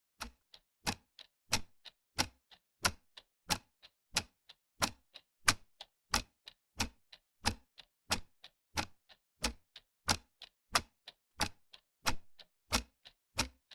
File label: Ticking